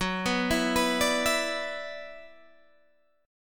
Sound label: F sharp 7th Suspended 4th Sharp 5th, down, Guitar, Open D